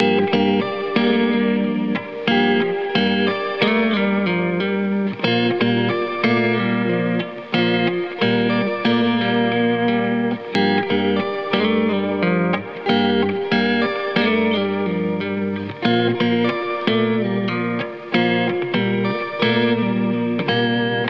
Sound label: dreamy; battl beats